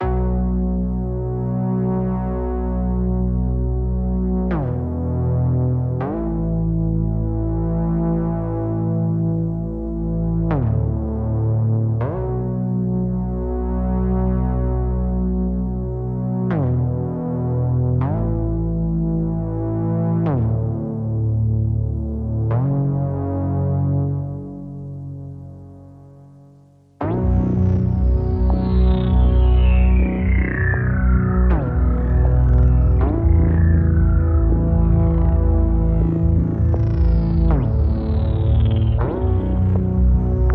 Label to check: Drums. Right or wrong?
Right